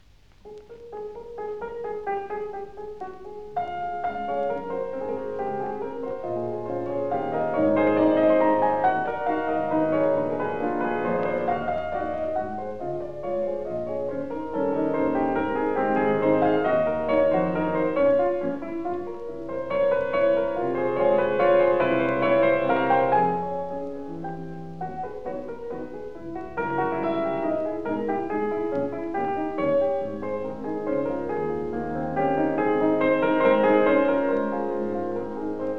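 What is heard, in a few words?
Nouvelle Etude in D flat major, piano; CHOPIN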